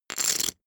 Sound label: poker chip stack big rattle